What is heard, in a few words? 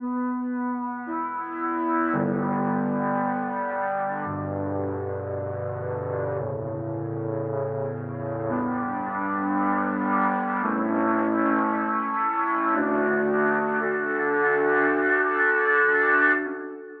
chords, 113BPM; Pony Fan Music Remix Stems